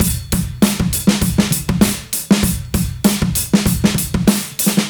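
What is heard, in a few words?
98 bpm, beat loops; Zero-G; Monster Beats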